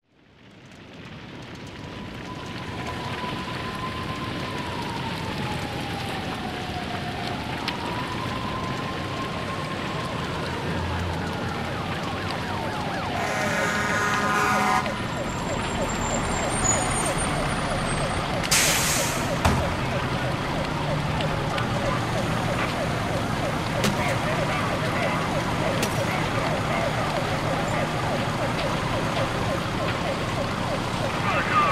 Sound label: city fire scene